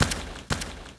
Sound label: Marlone Fighter Walk